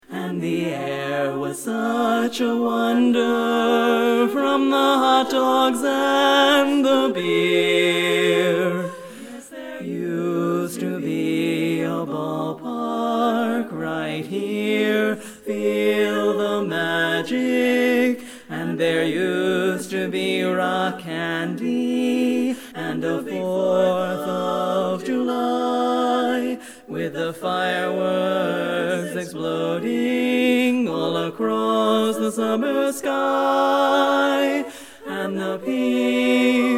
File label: Ballpark Bass